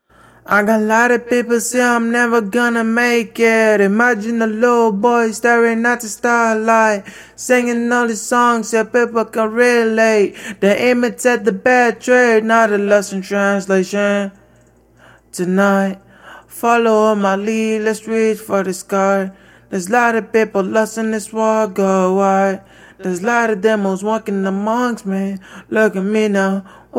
chill out 144 A, Acapellas

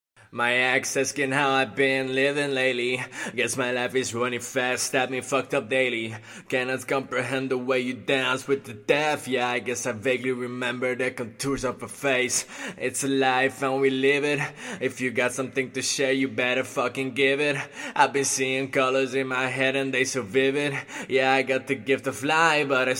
150BPM Key E, Acapellas

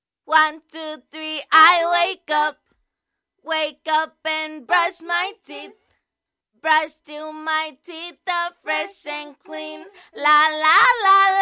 pop rnb african EbM 150

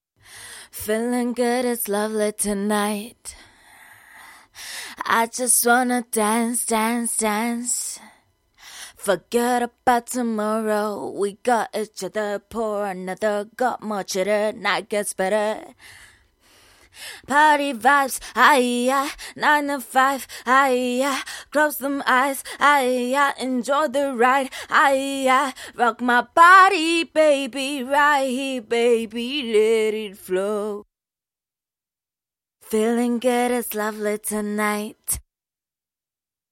pop rnb african BM 110

